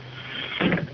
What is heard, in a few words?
guillotene